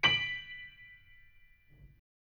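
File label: B minor highest, chords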